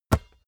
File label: basketball catch hard